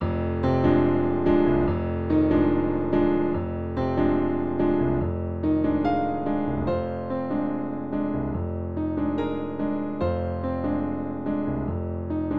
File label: Adventure Map Piano; Super Smash Bros. Brawl